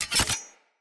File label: Sword Throw Combine; Weapons; Player; Swords; Garren Sword